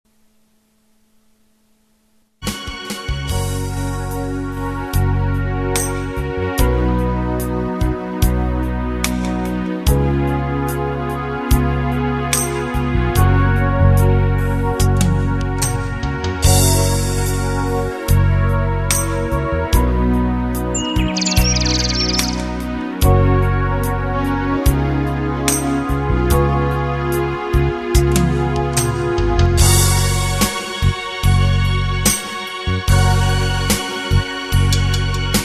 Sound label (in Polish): wersja instrumentalna